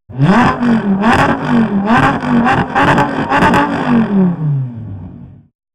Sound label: Limiter Revving; 转速